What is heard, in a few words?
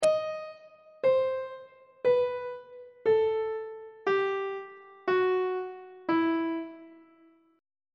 e harmonic minor scale descending on treble clef